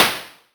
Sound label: Noise